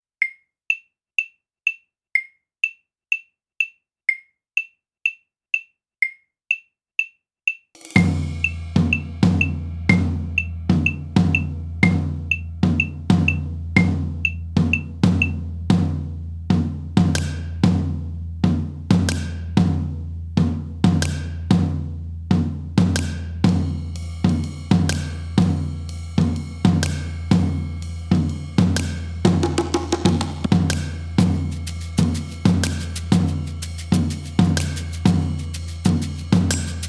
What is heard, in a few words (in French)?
contemporain, 124 bpm, 3-4